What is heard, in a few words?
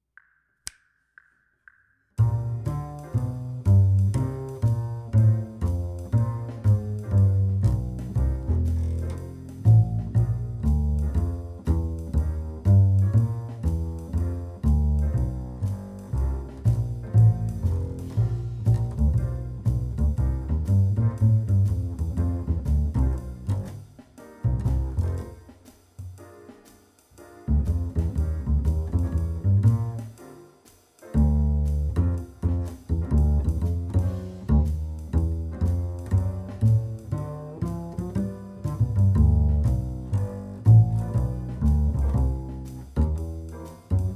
Jazz Bass